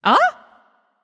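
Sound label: surprised, female voice; smite; voicepack; hera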